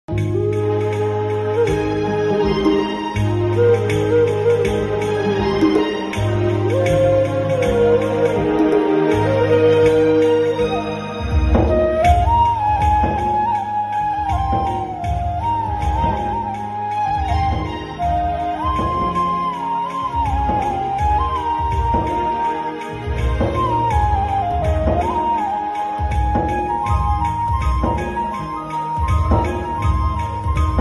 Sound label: flute song instrumental flute